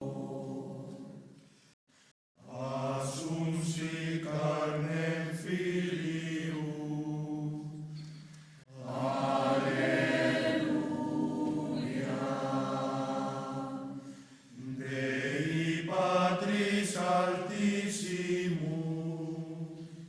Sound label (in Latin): Puer Natus, Coro Polifónico; villancicos